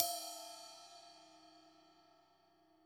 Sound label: Trash, drum